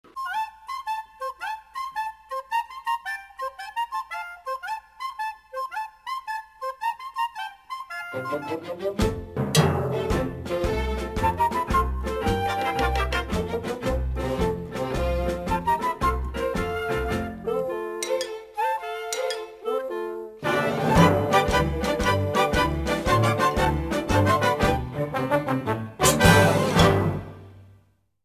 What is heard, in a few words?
main title; laughtoons